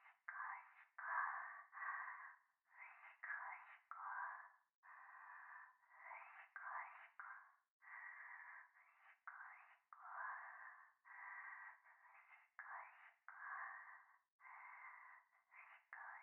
風呂 しこしこ

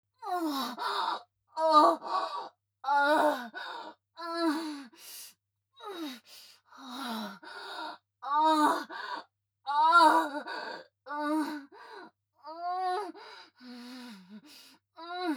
アナル 喘ぎ ゆっくり; ヘレナ

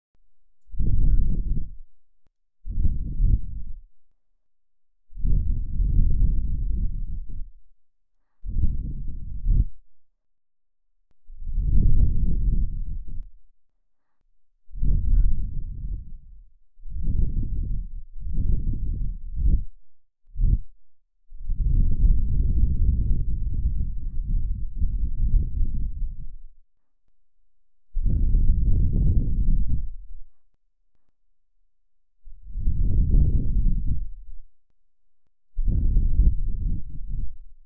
左右同時耳ふ～

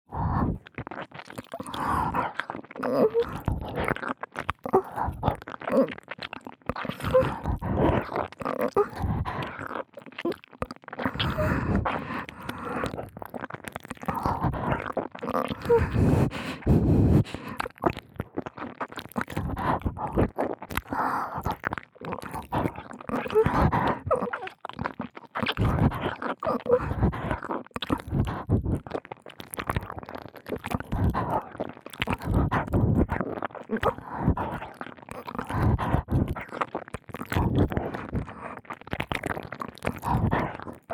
激しい耳舐め, 効果音無し; KU100

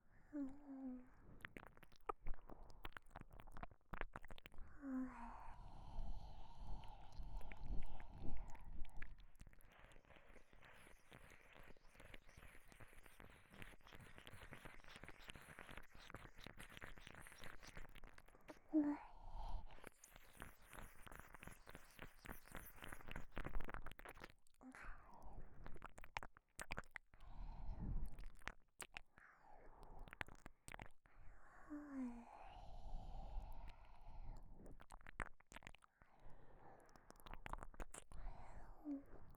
KU100 左耳 激しめ声漏れ

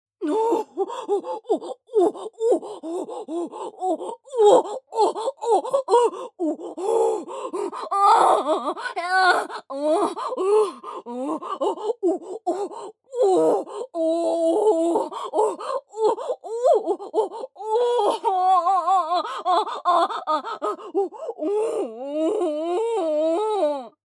2穴同時騎乗位・オホ声・加速; ヘレナ

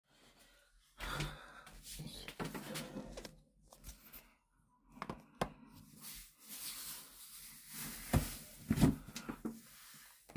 吐息のみな無言おしっこ

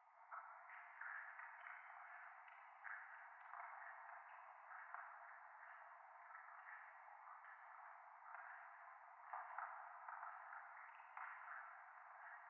廃墟 フェラチオ 電車

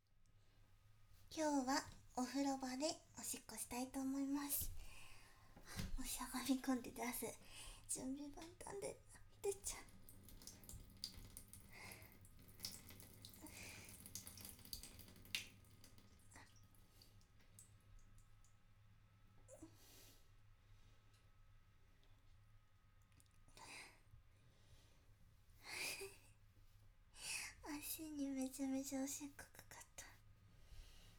お風呂の浴室で オシッコですッ バイノーラル

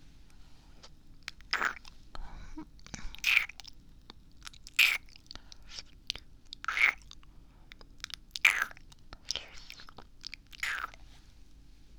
フェラ音(じゅる...等吸う音)

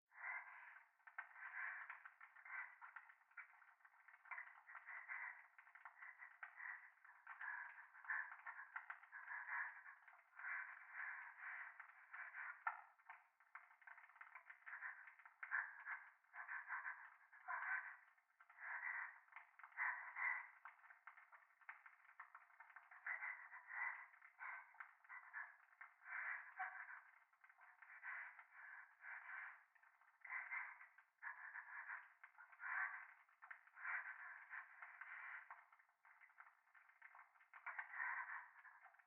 トイレ 右耳快感吐息 暖炉

